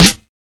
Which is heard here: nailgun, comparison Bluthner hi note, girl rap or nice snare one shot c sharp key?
nice snare one shot c sharp key